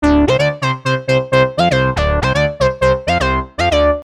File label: Lead; PatchFactory